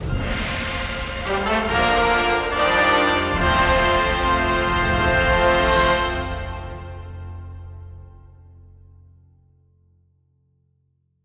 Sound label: Level Up Stinger